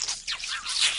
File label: infector idle; flood